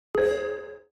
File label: ui modern notify user